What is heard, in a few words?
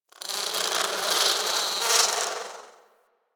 Garden Fly; Warframe; Ambience; Clan Dojo; Dojo Garden Animals